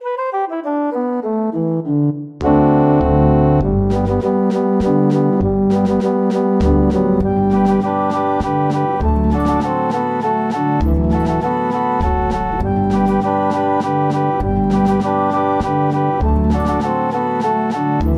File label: WALTZ